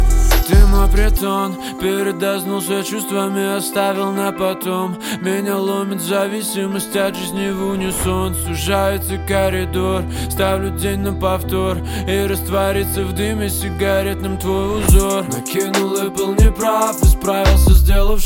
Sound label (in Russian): rington